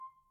Grand Piano Main Release C5, Kawai; Legacy

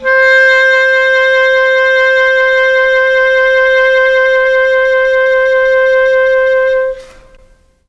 C4 clarinet, mellotron

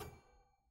Harpsichord Rel Main G3; Chordophones; Releases; Harpsichord, Unk

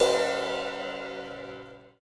Crash Softest; hydrogen drum samples

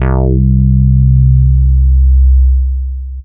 bass woodsy c

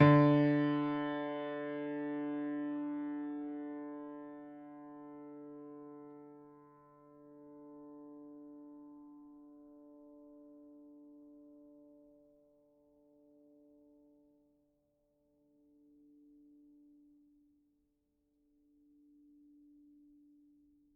GPiano sus D2, Grand Piano, Kawai; Chordophones; Sustains

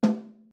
snare no snare f

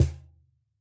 Cajon hit mp; Idiophones; Struck Idiophones

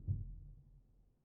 Player Ped Off, Upright Piano, Pedal; Chordophones; Upright Piano, Knight

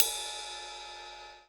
Ride Med; hydrogen drum samples

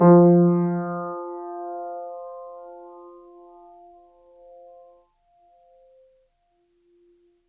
Grand Piano, Kawai, Sustains, F2; Chordophones

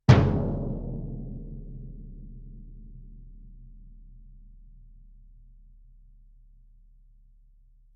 bass drum fff; Struck Membranophones; Bass Drum 3

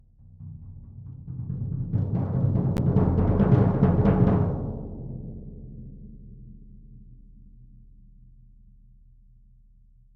bass drum roll; Struck Membranophones; Bass Drum 3

